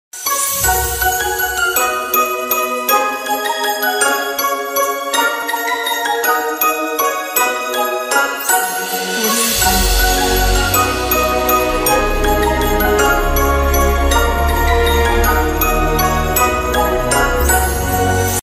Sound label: We Wish You a Merry Christmas, cisco ring